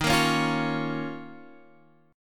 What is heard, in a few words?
F 7th Suspended 4th Sharp 5th D sharp